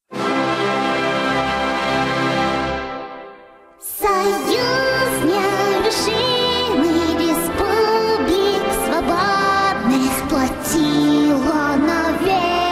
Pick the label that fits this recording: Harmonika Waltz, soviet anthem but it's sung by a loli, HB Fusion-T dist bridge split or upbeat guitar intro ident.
soviet anthem but it's sung by a loli